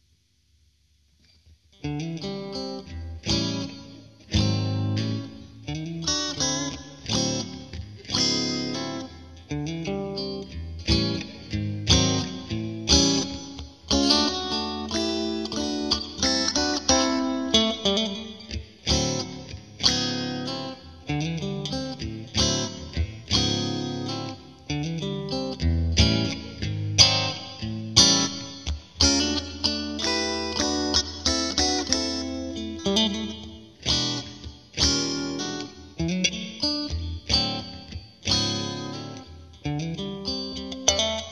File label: Mitte Bridge Twin Clean, vp strat56; Middle/Bridge 1